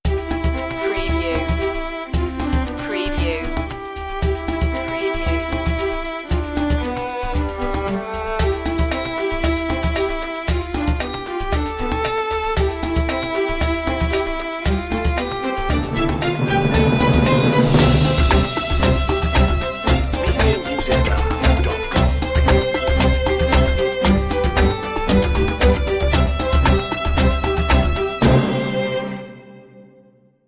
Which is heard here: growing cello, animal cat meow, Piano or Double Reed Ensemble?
growing cello